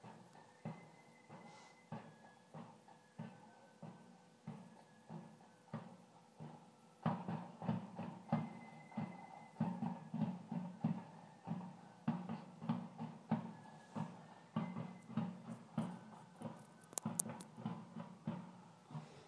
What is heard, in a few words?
pipes drums galore; yes it s a quiet peaceful saturday morning